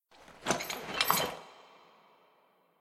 anim exit angry

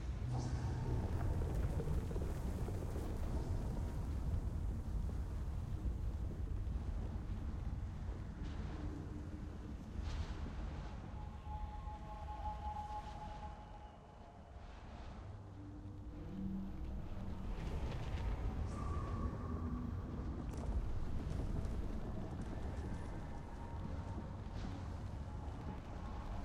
amb shadowisles court

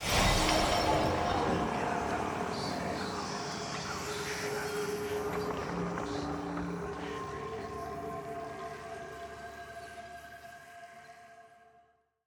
ready check portal; classic tt

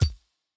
button swap click; champ select

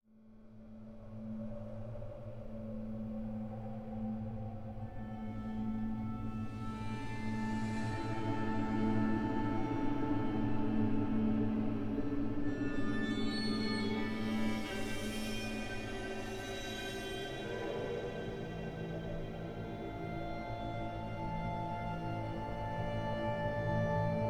music in queue loop; aram; howlingabyss

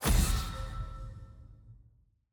ally ban; draft; champ select

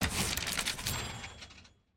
fanfair; tent

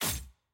aah; evelynn

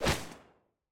miss; samira

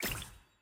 ui room select room stars

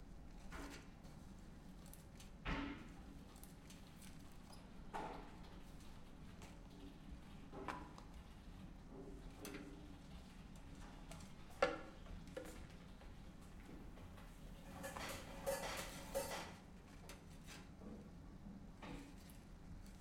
amb main

